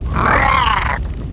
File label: WAR ATTACK